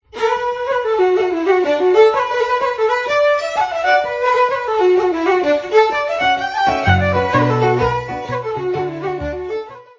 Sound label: flute